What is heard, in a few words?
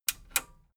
metal button radio click disable slow